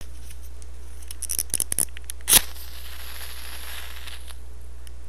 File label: match